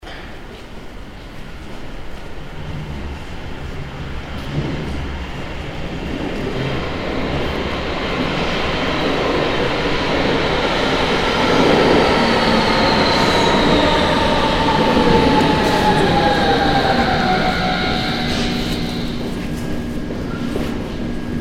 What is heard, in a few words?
subway arrival